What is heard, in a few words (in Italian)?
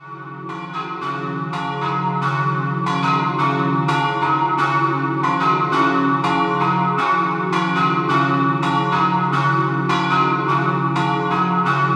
Fanna, campane